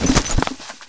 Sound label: kick miss